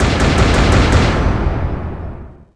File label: guilty